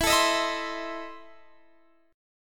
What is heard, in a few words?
E Major 7th Suspended 4th Sharp 5th, Guitar, DADGAD